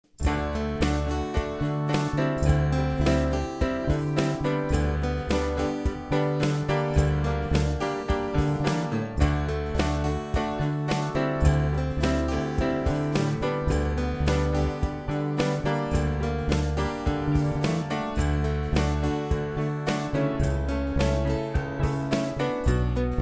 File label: accomp; HISWords Music; Psalm; No Vocals